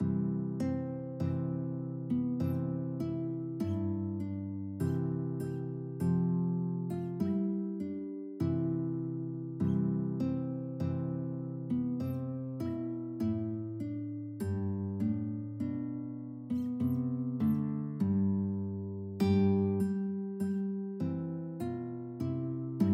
hark, guitar

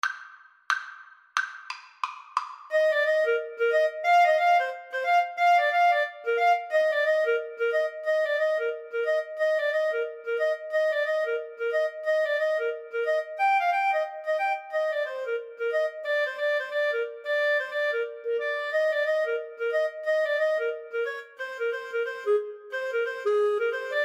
when the saints Flute Clarinet duet

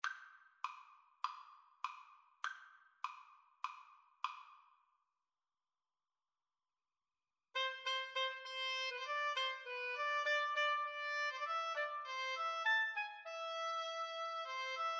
danses des cygnets, 2 oboe

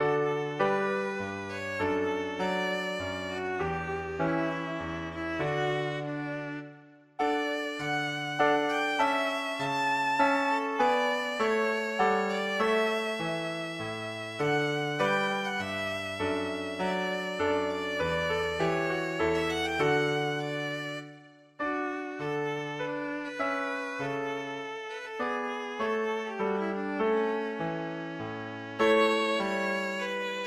sarabande, violin

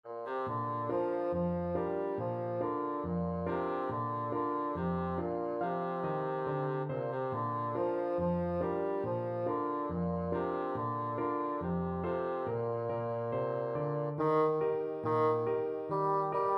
alabama, bassoon

